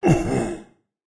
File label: Creature Sounds Giant Voice; raycaster